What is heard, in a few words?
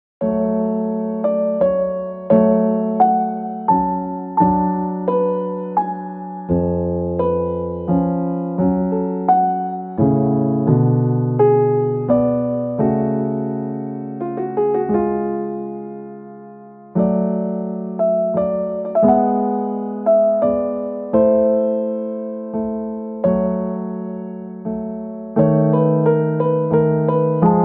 Mozart - Piano Concerto No. 23 in A Major, K. 488 - II. Adagio; neon inferno original soundtrack